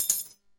pin drop

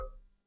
year tick

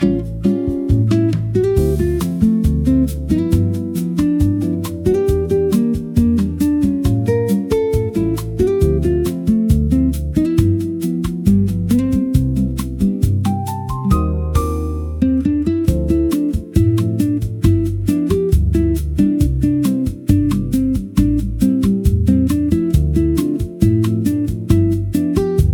calm, music

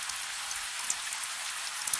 event rain

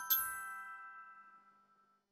milestone; tourismustal

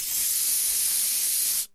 build cloud seed

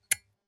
toggle switch

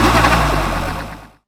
vehicle truck, sfx

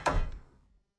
door open

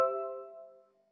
game start

